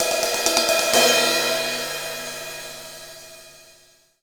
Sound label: Cymbal Roll; Essential Percussion